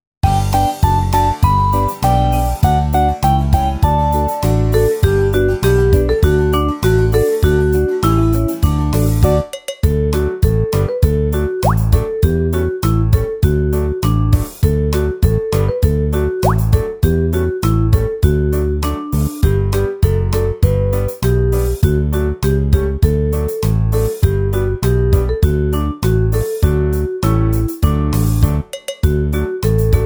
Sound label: Karaoke